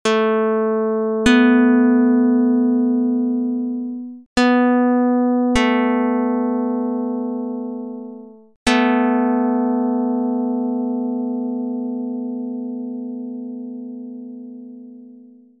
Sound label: pluck; 9/8; dr220